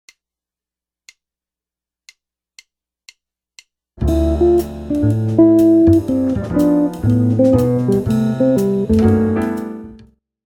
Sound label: lick, jazz guitar